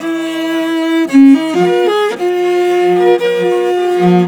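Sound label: VIOLI LP, MORIN violins, Asian Traditions; Soundscan vol.60; AKAI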